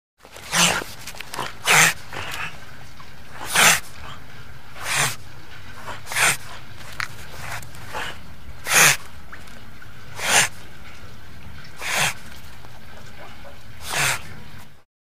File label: Otter River Snarls